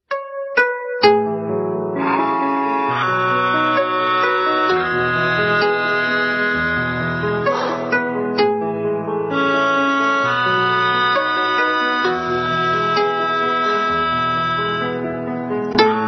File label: instrumental